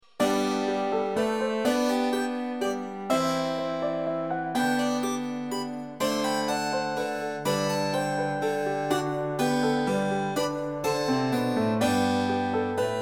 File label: Minuet In G